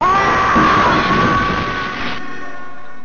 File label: ahhh; gamesaba